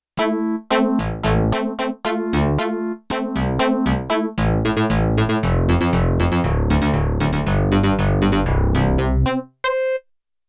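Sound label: Rock Orchestra; Rock Performance 2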